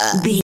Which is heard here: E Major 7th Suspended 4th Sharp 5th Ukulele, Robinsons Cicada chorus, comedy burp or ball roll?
comedy burp